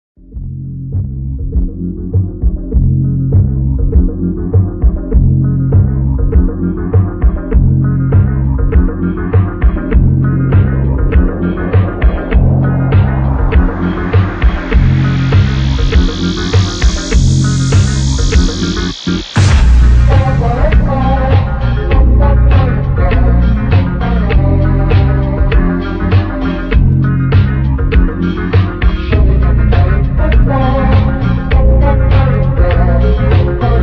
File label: VHS LOGOS; CHIMERICAL